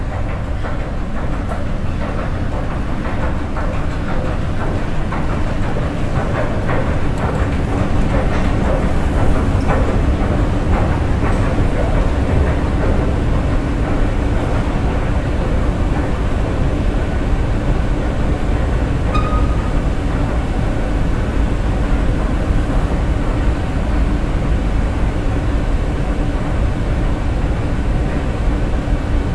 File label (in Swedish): rulltrappa